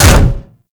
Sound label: Contact Hit Effect, Vehicles; Warframe; Archwing